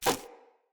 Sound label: Grunt Foley Throw; Enemy; Characters; Corrupted Sproutfolk